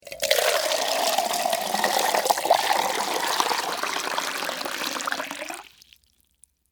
Water Pour Metal Pot, From, Metal, Flower, Pot, Into, Plastic, Container, Long; Pouring Sound Effects; BlastWave